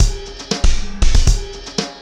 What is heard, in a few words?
Beat, Drums, Eclectic Electronica